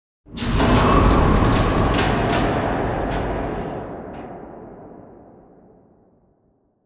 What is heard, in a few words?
Giant Floor Panel Exit On Track Start; Boomtown; Sideways Town; Titanfall 2